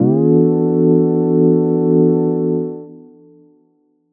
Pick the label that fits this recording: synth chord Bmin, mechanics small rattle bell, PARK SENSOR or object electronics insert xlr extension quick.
synth chord Bmin